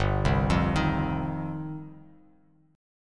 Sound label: G 7th Suspended 4th Sharp 5th, Bass Standard; G7sus4#5 arpeggiated